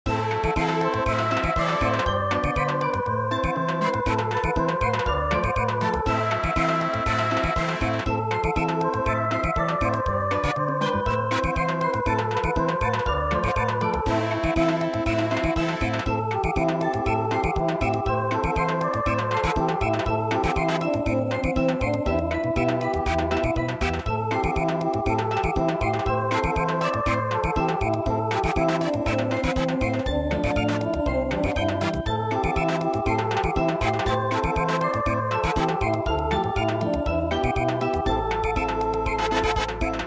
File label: March Samba, traditional; midi